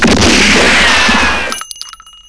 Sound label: winch; gunslinger